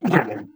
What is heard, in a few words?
ballbot pain sm; player; Portal 2